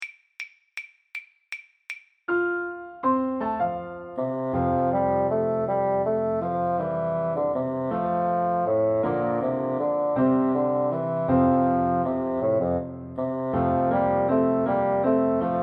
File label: the maiden blush piano basson